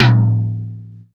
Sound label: Tom, Roland Digital Drum Brain DDR-30; Drum Samples